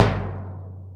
SURDO B; Best Service Advanced Media Trax Modern Composer

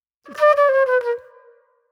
Soul-Jazz Flute, Call And Response

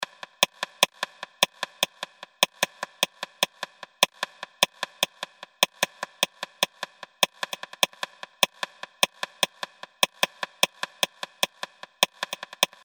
Rimmed, percussion, industrial

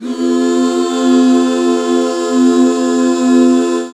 HAL F, BACK CHORDS, Roland; Sound & Vision - Gigapack II CD 1

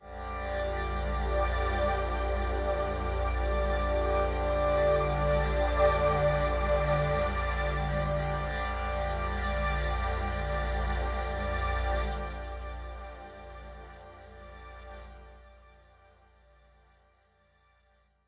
One Shot Drone D, dystopian drone; One-Shot Drones

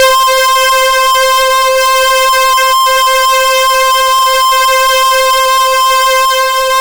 C5, Synth Line; 90 s Sample CDs; NiceBeats; SynthLine VOL-21

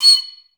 STAC C7; Best Service; Peter Siedlaczek's Advanced Orchestra

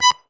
AKK; Best Service; Alpine Volksmusik; AKKORDEON

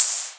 Open Hihat

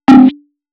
Snares, Alesis Performance Pad

